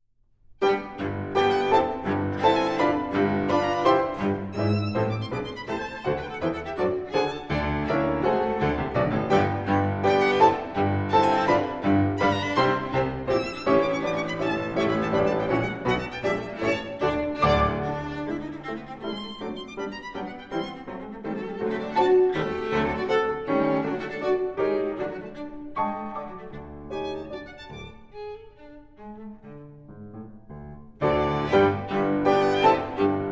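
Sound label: piano trio; coleridge-taylor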